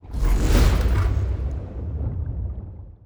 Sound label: ship juggernaut move; aquatics